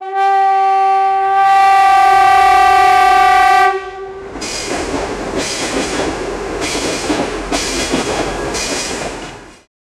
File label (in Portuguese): buzina de trem antigo